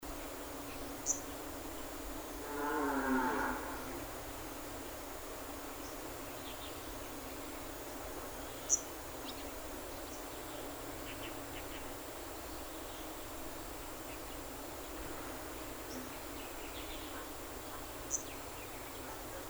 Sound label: Arctic Warbler Phylloscopus Borealis Call, Fujian Changle; Cris de Pouillot boréal